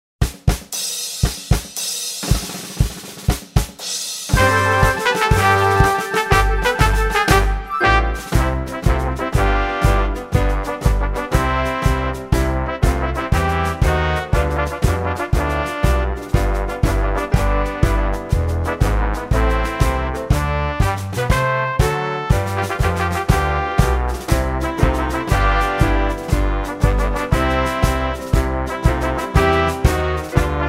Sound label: Brothers Are We Instrumental